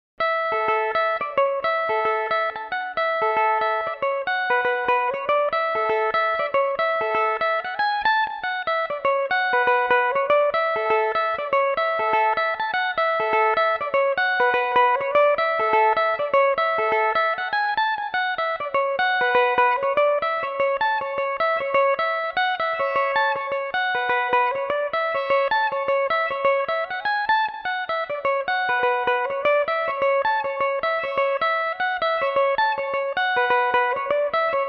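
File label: A major, Irish mandolin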